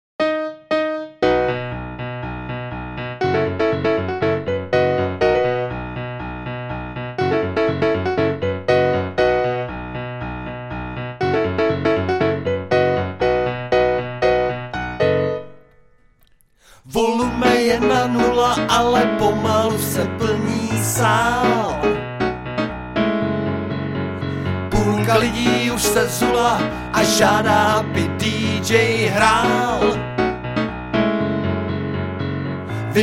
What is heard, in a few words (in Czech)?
klavir